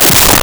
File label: Radio Hit Descent; Transitional Elements; 1001 Sound Effects